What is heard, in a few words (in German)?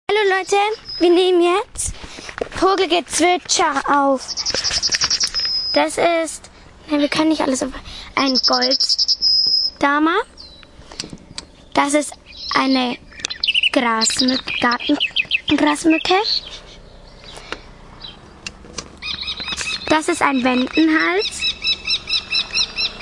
Wald Vogelgezwitscher